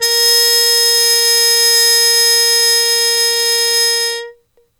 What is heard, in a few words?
MUTE A#1, Mood Trumpet; E-MU Formula 4000 Series Vol. 1; Hip Hop Nation